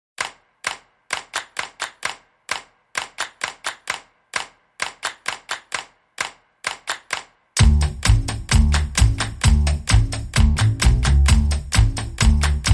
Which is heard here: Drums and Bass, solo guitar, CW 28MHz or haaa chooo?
Drums and Bass